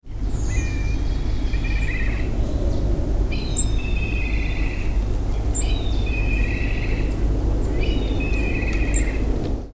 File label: Milvus migrans Rufe